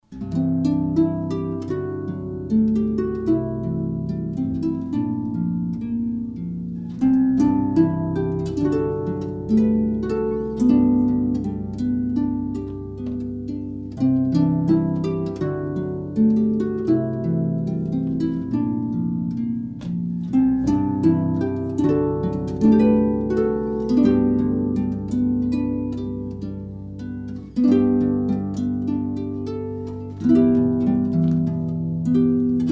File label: Harpist; Seattle; Leslie McMichael